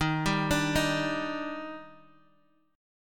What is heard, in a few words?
D sharp Major 7th, Guitar, Half Step Down